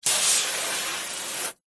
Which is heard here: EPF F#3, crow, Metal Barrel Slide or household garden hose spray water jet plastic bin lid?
household garden hose spray water jet plastic bin lid